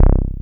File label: BASS, 145 BPM; Ueberschall; Drum & Bass Resonance